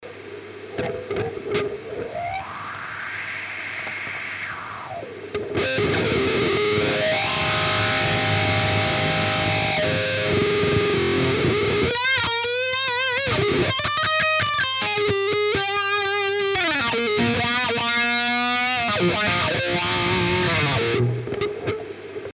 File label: vox